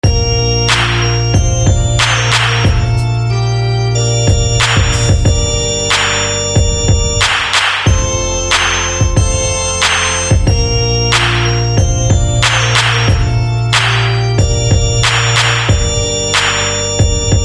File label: Praise Organ; SFB FX